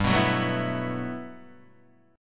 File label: G Major 13th, Guitar DADGAD